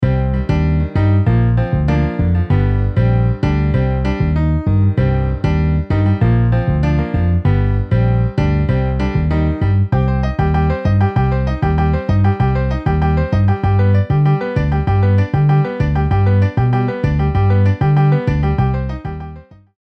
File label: Piano Bass MIDI